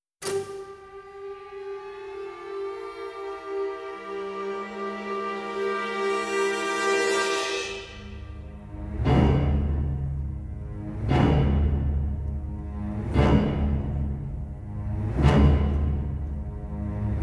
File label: trap; dutch builds